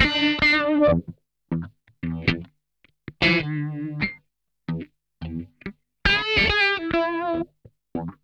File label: Funk Guitar; 90 s Sample CDs; Zero G